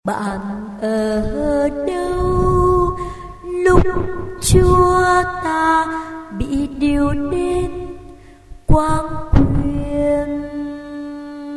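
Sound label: soprano tenor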